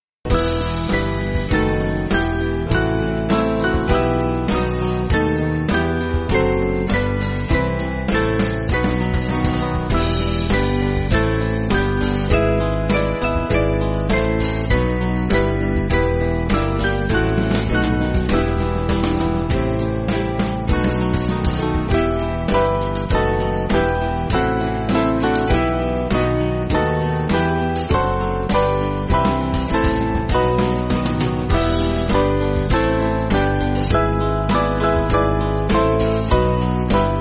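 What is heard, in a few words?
Band, Music Backing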